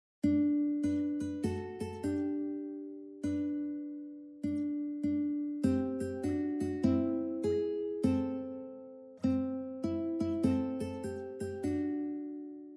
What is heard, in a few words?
pokare uke